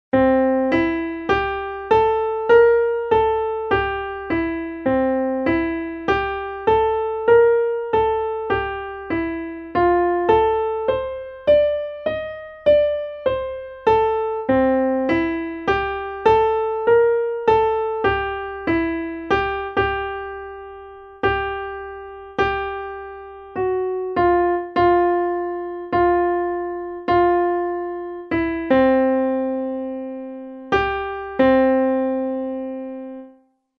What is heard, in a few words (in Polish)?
melodia rock